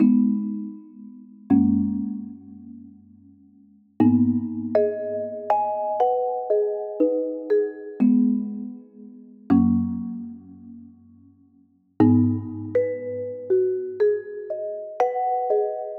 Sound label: Mallet Loop 120BPM G#min; 99Sounds; Monosounds; Music Loops; Instrument Loops